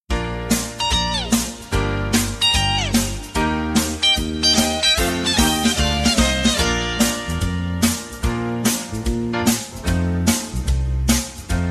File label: ACCOMP Bb